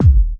deep dance kick; Kicks; single hits

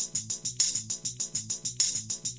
lofi tambourine, drum loops and breaks, 100 bpm; 091 to 100 bpm

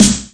snare

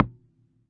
Guitar Amp Trim, Found Percussion Hits; ModeAudio Found Percussion Hits

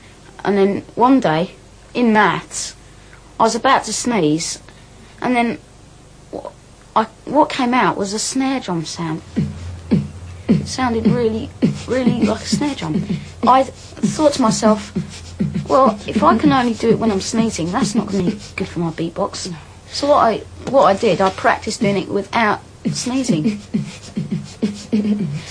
learned to beatbox by sneezing, MALE

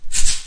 rainstick shaker; ETHNIC and WORLD PERCUSSION

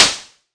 crunchy kit noise prc; drums; hip hop; STYLE KITS (Hip Hop, Etc)

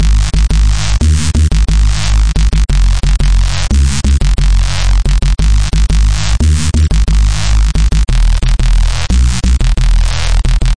bass C; On A Roll

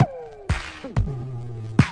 125 bpm disco, drum loop; 121 to 130 bpm

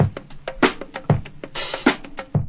conga funkit, drum loops and breaks, 097 bpm; 091 to 100 bpm

